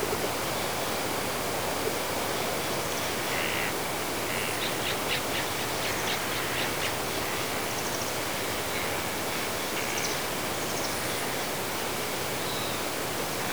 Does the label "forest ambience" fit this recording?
Yes